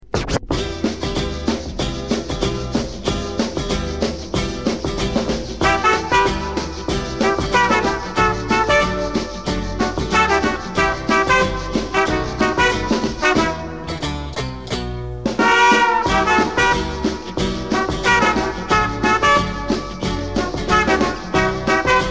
Samba

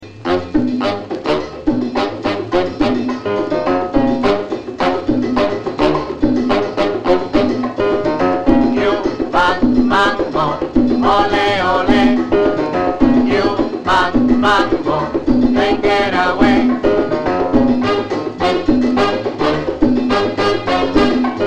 Cuban Mambo

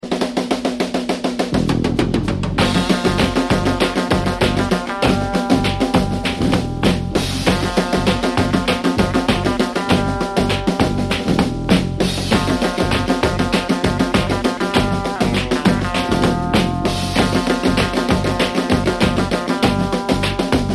big kick plain scrap

